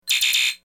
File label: Computer Warning Bee, beeps & tones